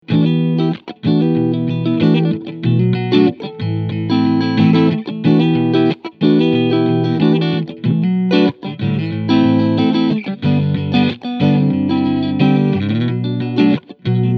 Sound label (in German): S7 Vintage 3TS Cleansound Neck Mitte Pickup; Larry Carlton